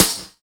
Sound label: METALLOID, BONUS SN; BONUS SN2